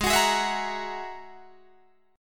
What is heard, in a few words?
G sharp 7th Suspended 2nd Sharp 5th, Guitar, Drop D; 18,21,18,21,19,18; down; chord shape